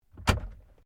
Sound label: CAR SUBARU LIBERTY INTERIOR GLOVEBOX CLOSE; Family members Subaru; Vehicles